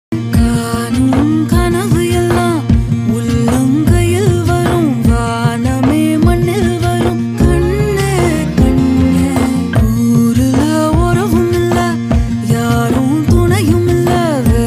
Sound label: tamil love song